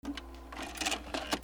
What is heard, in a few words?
HOUSE